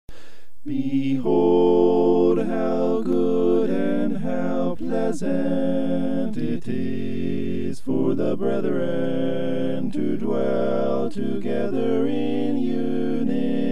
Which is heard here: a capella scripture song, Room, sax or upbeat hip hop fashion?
a capella scripture song